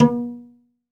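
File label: SOLO CELLO, Synclavier Strings; ILIO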